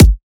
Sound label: Riddim Kick